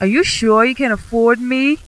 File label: dead girl